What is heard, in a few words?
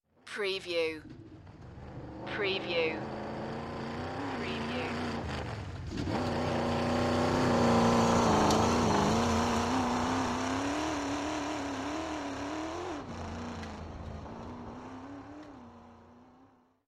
Chevy V8 Hot Rod Drive By